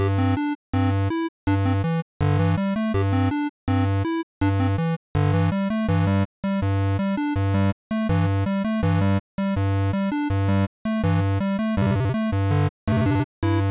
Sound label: game music